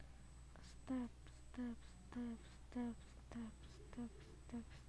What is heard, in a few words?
stab